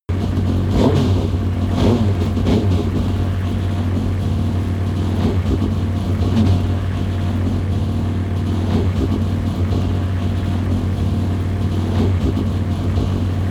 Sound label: motorcycle engine damage steady